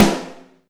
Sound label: Snare, Alesis DM5; Drums